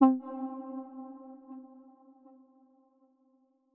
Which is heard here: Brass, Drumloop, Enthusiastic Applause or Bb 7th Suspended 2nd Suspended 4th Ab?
Brass